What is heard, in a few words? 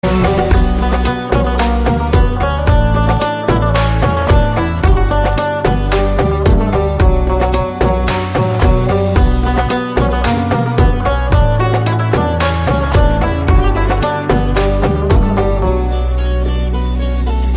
Instrumental